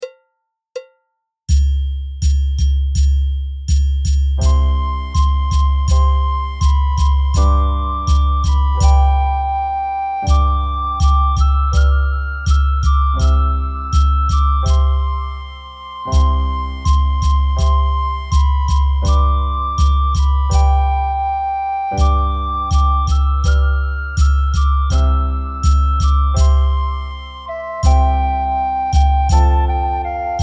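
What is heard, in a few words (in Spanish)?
campana sobre campana con percusion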